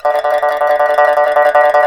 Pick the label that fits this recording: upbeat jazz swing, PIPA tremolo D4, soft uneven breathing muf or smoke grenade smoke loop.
PIPA tremolo D4